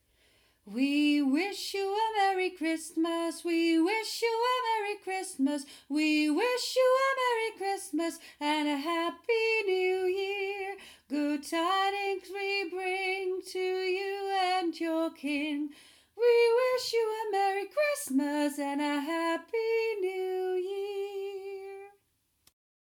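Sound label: we wish soprano; we wish you a merry christmas; sopraan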